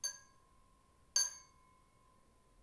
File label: crystal; cy crystalcave